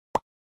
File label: drop block sound effect; minecraft